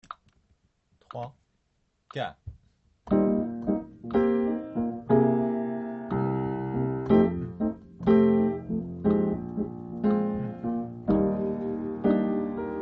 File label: Georgia piano